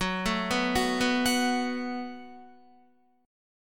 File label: Gb Minor Double Flat 5th, Guitar; Gbmbb5 arpeggiated